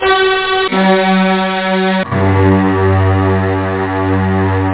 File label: strings; media show; Instruments